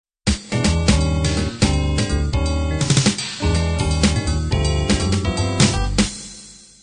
b3 jazz intro

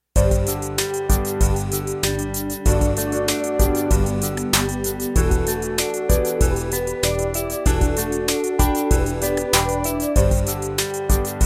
16 beat analog

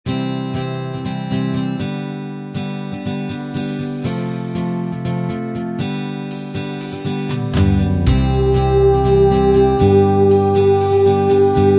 classic beat intro

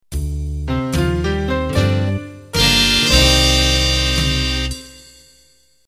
Band End

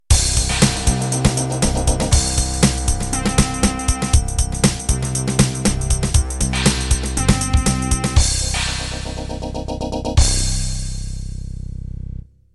Jungle end

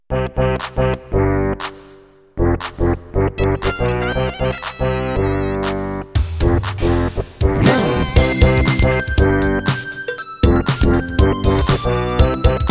Rap Intro

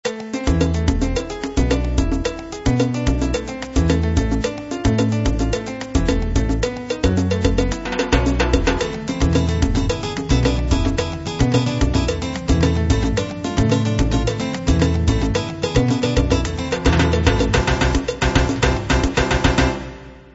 salsa

